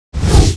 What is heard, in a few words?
ATTACK JUMP